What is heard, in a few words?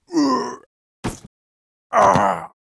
die; Monster; 철교삼